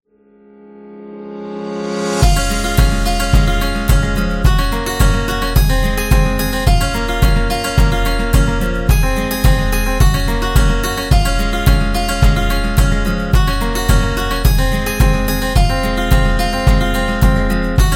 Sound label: Acoustic Rock